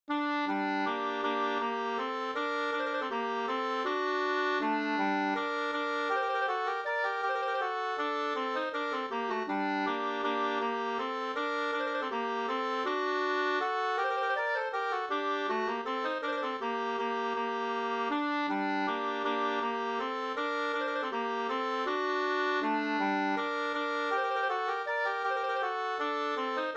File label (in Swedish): vals g moll